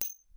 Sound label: Stone Hard; Magnum